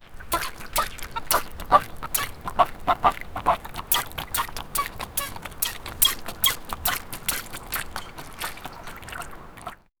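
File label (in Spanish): pato tirándose un chapuzón, splashing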